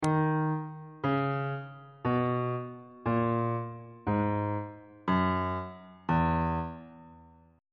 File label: f flat lydian mode descending